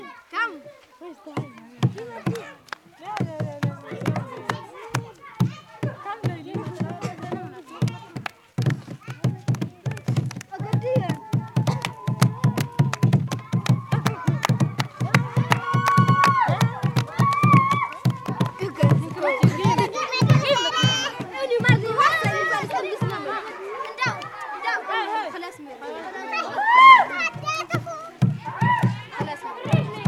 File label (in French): chants percus reverb naturelle, Touareg camp, north niger